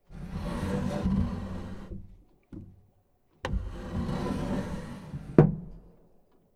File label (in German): Damensekretaer Tibattant Schublade oeffnen schliessen